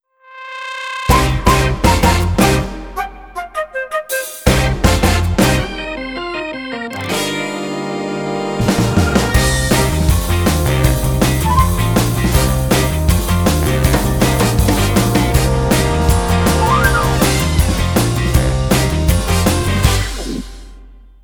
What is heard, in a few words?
Victory Funmaker